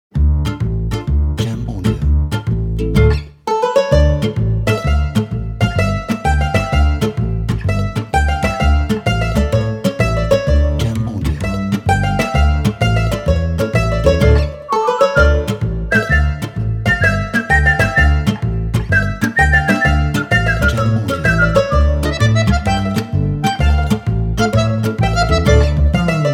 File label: Italian Music Restaurant